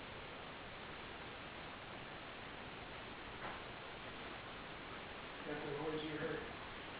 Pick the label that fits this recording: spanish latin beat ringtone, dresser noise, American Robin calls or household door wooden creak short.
dresser noise